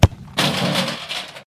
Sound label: Street soccer ball kick into soccer goal